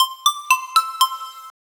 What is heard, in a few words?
merge 3 mobile game combo, melodic